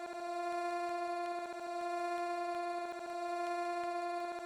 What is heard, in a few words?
cello click